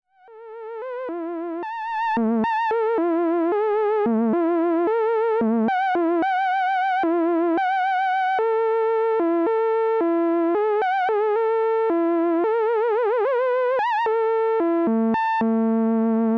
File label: Violin; TTSH Patchbook